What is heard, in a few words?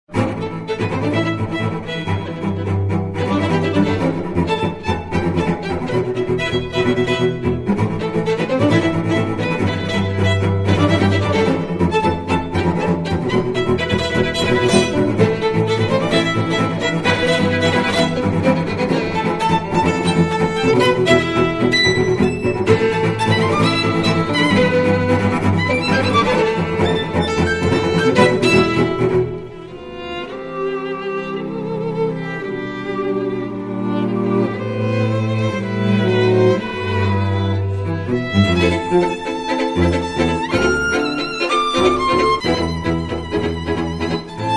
Harmonica